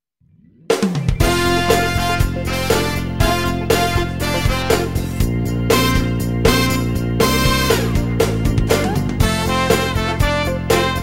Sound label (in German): trompetentaxi